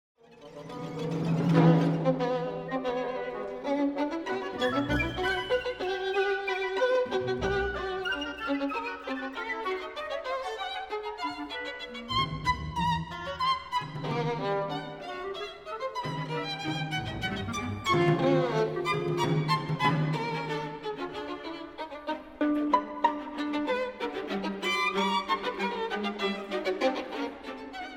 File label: Violin Concerto No. 2 V. Scherzando; Penderecki; Metamorphosen